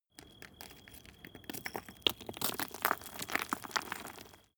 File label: stone small landslide short